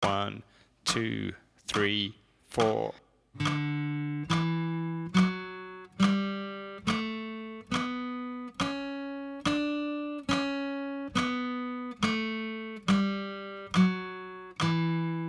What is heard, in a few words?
scale d major, guitar